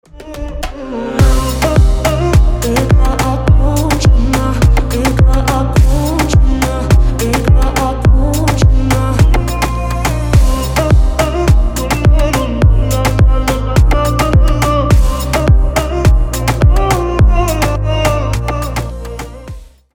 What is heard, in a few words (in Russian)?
Без Слов